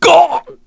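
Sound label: Vox Damage, Korean; Dimael; kingsraid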